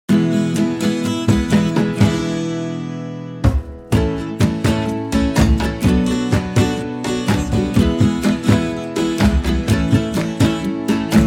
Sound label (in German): Liebes Geburtstagskind Instrumental